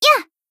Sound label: Battle Shout; Kotama Camping